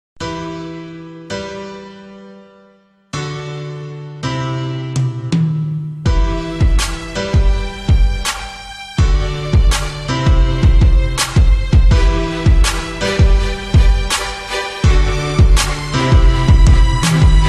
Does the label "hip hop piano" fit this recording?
Yes